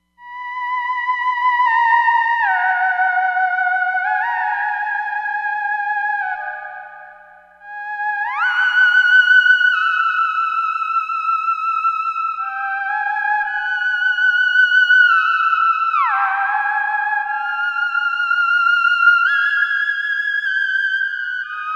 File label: Theramax